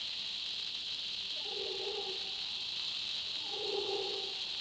amb swamp; naboo; Star Wars Obi-Wan; XBOX

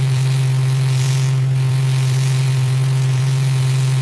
gnaty

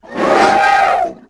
ROAR; XBOX; Crash Bandicoot The Wrath Of Cortex

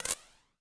bad zap

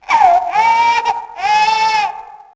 baby crying; Max Payne; nightmare

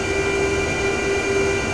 walkway move lp, movers platforms

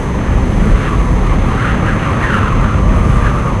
high wind; WhiteOut

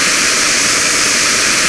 air hiss mix, ships engine; Quantum Redshift; jordan

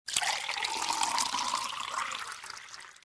pour drink

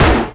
open metal

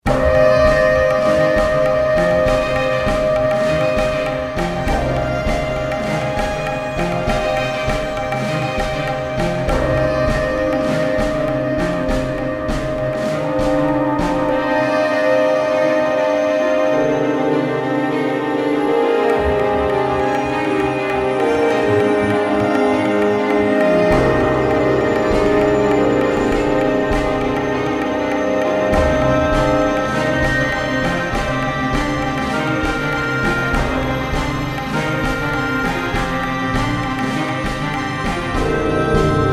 fast, music; Soldier Of Fortune II Double Helix; XBOX; finca

